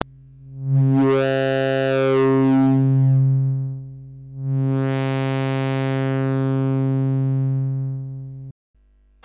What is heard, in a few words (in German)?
form pro moog; emu workshop